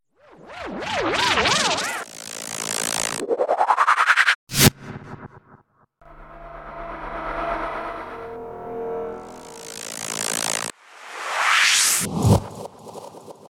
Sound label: Backwards